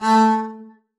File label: arco a3 ff down, double bass